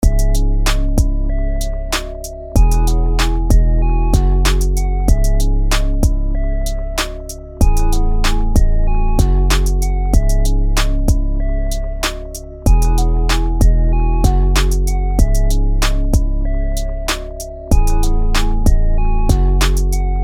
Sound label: plugwok loop